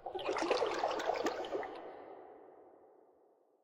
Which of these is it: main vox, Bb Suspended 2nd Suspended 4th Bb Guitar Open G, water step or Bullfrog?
water step